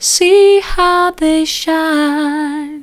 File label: see how they shine soft, vocal